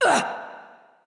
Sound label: grunt hit ghost; smite; voicepack; hel